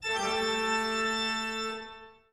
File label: Toccata SMS